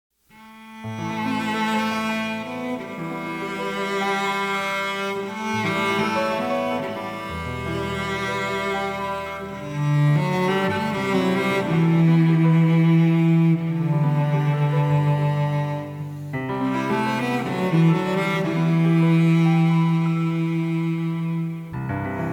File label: Instrumental